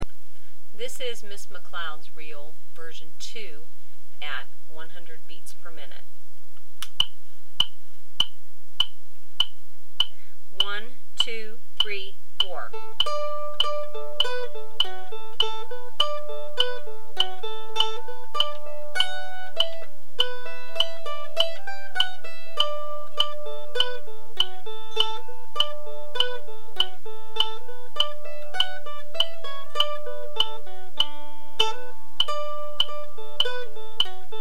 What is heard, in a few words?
100bpm, mandolin